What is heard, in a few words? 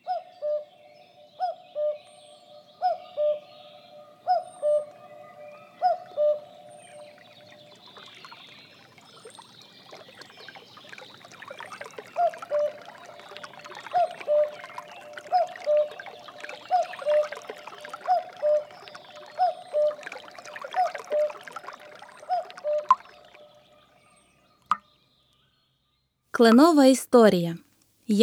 Maple Sap Song